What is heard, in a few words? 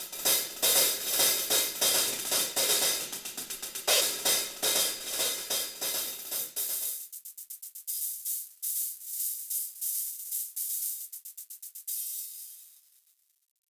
transep charley smooth harsh